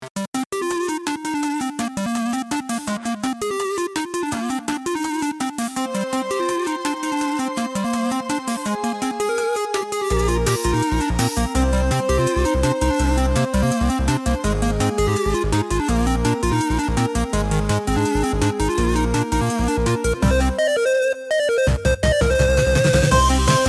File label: battle, bgm; asciinevrdie; battle act5